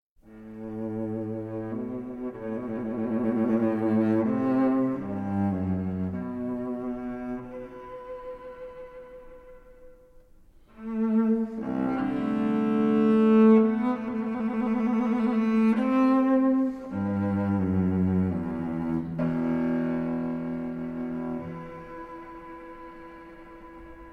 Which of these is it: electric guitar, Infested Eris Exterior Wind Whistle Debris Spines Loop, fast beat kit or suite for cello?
suite for cello